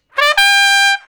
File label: Trumpet Shuffle (G); Solos